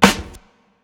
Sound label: Snare